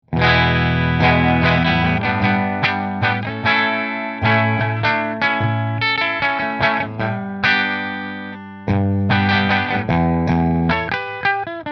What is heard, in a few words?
McNaught Purple Bridge Fender, guitars